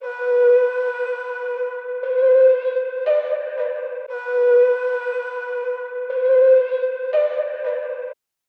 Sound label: 118 BPM A Min Flute, melody loop stem; Trap; Loop Stems; Cymatics; 2022 Melody Collection; Trap Pt 2; In The Cut